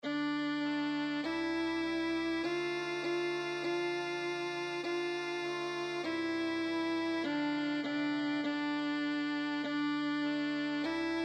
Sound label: Violin; Dioses del Olimpo; 5º Antiguas Culturas, 5º Historia y Narraciones